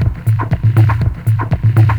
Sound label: 120 Dance E-Perc; Dance DJ; Percussion